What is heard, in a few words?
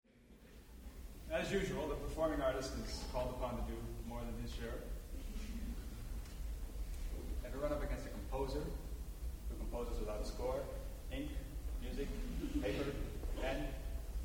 piano pieces